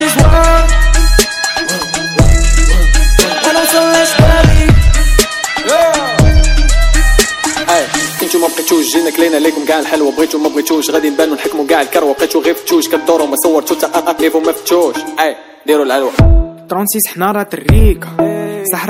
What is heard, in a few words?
rap song ringtone